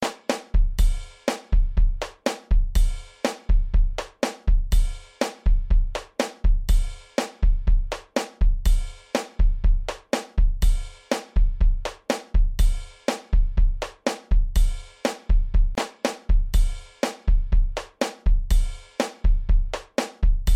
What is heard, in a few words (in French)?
pattern batterie